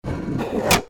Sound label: door lock